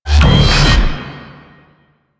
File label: Mech Sword Slam Charge; Warframe; Vehicles; NechroMech; Abilities